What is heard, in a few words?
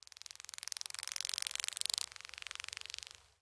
Forest Open Glade Grass Hopper Fly By, Day; Ambience